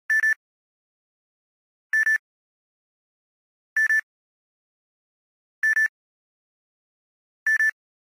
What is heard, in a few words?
Beep beep Samsung Galaxy Note Pro 12.2 LTE Ringtone